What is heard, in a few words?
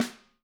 wood; Sampleheads; Peter Erskine Living Drums